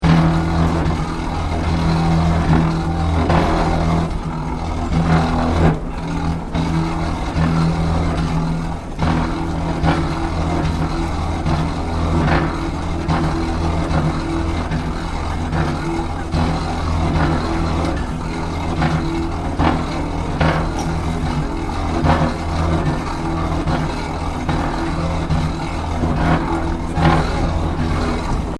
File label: Ambience Scary Machinery, Broken travelator at Seoul airport in Korea; Loop Stereo